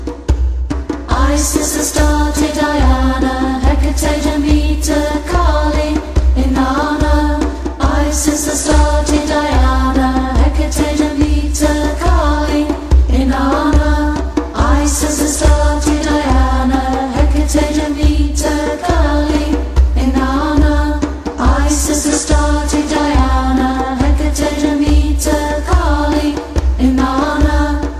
Wicca Goddess Chant

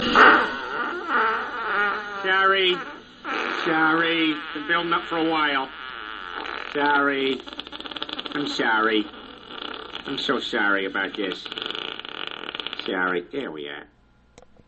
Fart

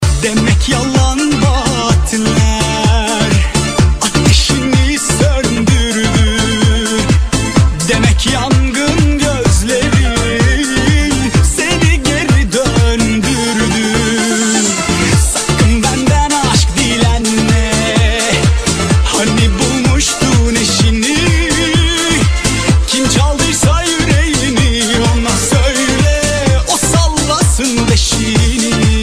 Turkish Remix

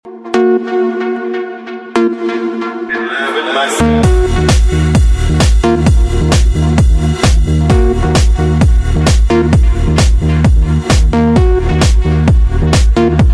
Electronic Trance

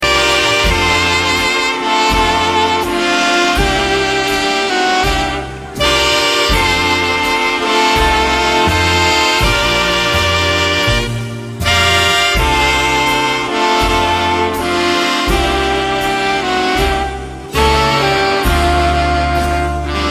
New Years Song

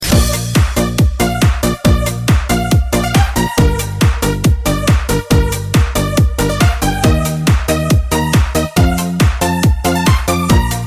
Beautiful Girl Beat

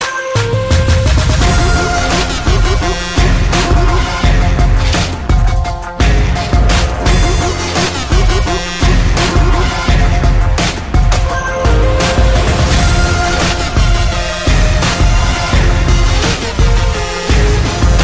Epic Game Music

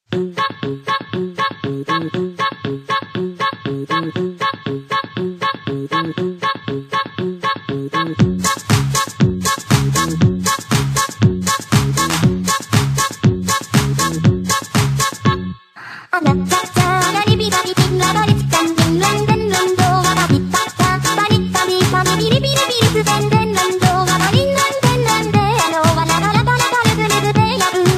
Polka

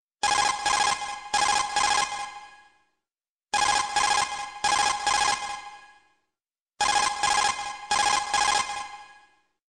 Codec; mgs2

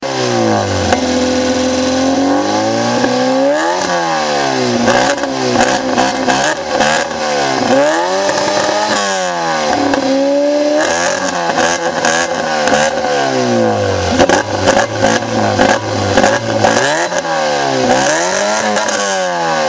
Tuning

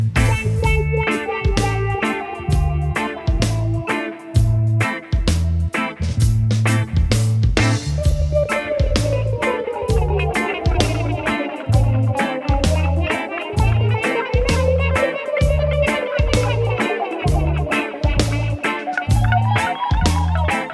Dub; Destroyah

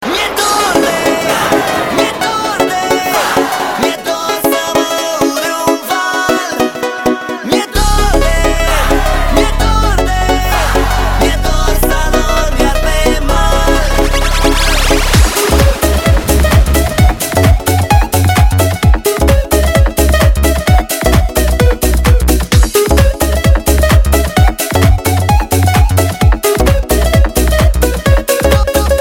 Voltage Club Mix

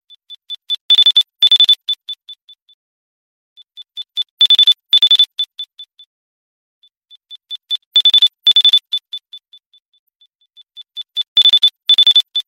Ring With Echo

